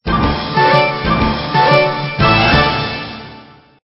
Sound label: Stinger; STINGERS